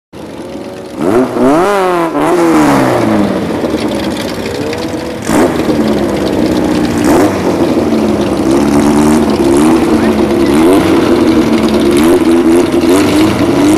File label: VW Kupla; VW Kupla conv 69